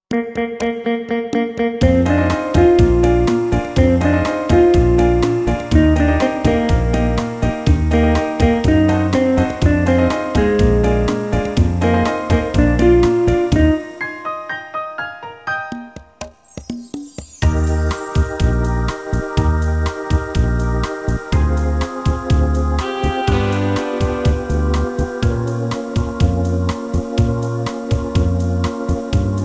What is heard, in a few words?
Es dur alto